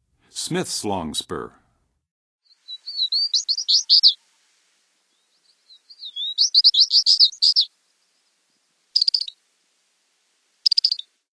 Smiths Longspur, Songs of Birds of North America; Western USA; Western USA Songbirds

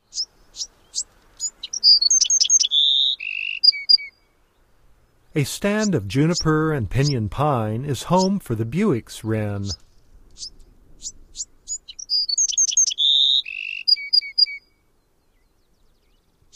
Bewicks Wren, Bird Sounds; North America; Common Western Species; Know Your Bird Sounds

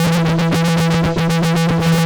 Hyperfuzz, Guitars; Rock

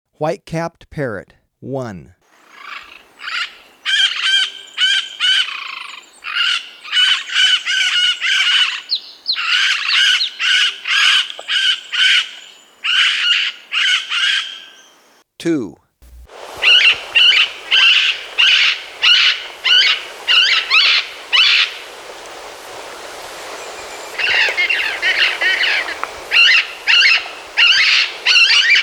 White-capped Parrot; S. America

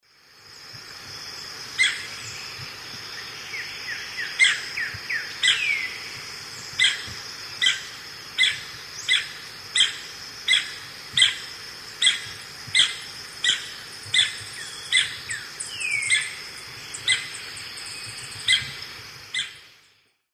Chrysoptilus punctigula, calls; S. America; Eastern Lowlands

